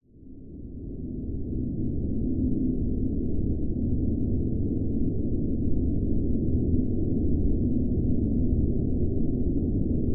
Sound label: nature wind cold blizzard constant droning designed